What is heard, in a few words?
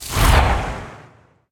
cast arcane; smite; god merlin